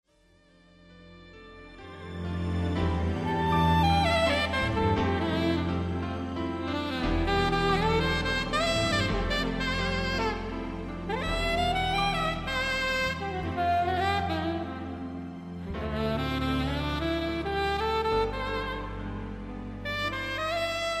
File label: Moon Sax